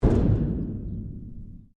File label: Strike Metal Hollow Tank Soft; Materials